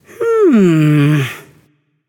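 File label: thinking, nonverbal; smite; voicepack; khepri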